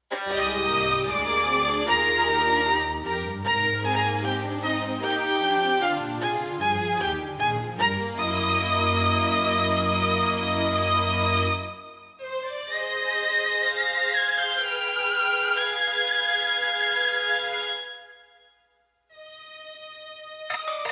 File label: instrumental version